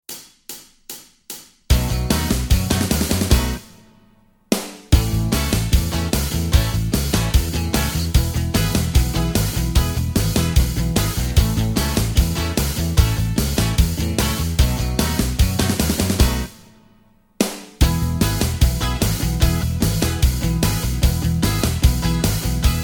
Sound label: No Lead Guitar